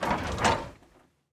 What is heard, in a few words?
lever down, stone door